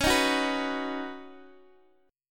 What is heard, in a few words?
Db 7th Suspended 2nd Suspended 4th, Guitar, DADGAD; x,x,11,8,9,9; Db7sus2sus4 strummed